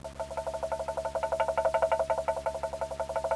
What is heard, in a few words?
blocks, music; WAV Sound Effects